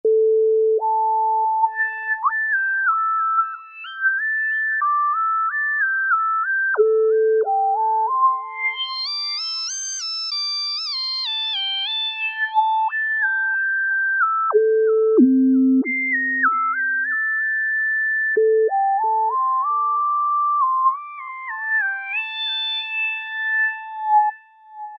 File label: R&B Lead, Korg microKORG; Factory Voices